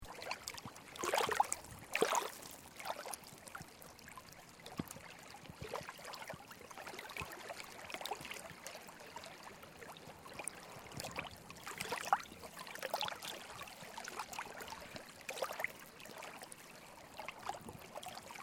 Quiet Lake Water Lapping Long, Gentle water splashing, lapping; like rowing boat, paddling on water, calm lake